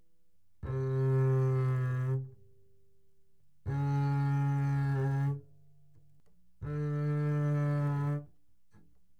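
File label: Bass arco fortissimo sul E C3 D3, doublebass; Strings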